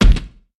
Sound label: Dirt Land; A Robot Named Fight